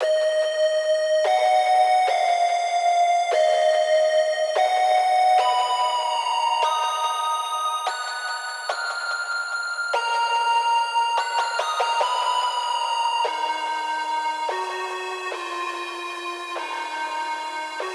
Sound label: 145 BPM D# Min Lead, Analog; Melodies; Loop Stems; Cymatics; GHOST (Beta Pack); Emerald